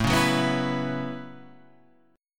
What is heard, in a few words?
F sharp Minor 11th